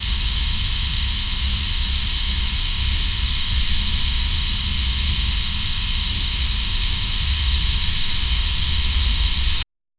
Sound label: film leader hiss subtle